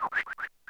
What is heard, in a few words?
Triple Jump Flip; Wii